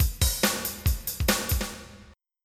rock; fruity; flstudio

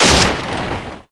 Cannon Get hit; Pirates Of Treasure Island

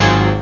PIANO; Atari Mega Archive; synths

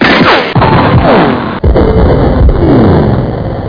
my shot; Amiga Format CD

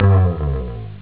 slide, synth

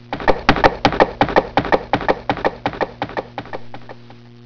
horses; animais

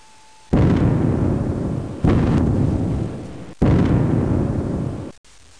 CANNON FX

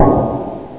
soft hamr

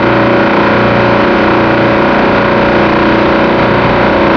atmosphere; KATANA; Daikatana

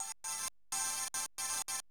SYNTHPAD HOUSE 125 A; eJay; Sphere

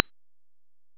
DEEPBASS; snake2d

